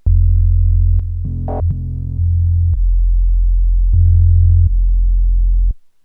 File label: Behringer UB-Xa bass